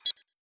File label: Beep Multiple; Multimedia Internet CD-Rom Flash